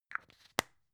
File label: plastic spray can small close clatter